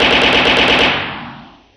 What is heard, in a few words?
weapon bullets; spacetac